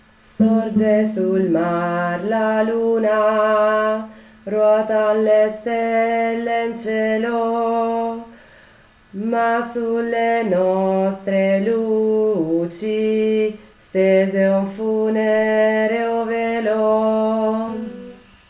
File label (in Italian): tenori